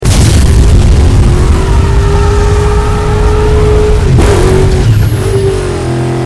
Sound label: shelby turbo on loop; vehicles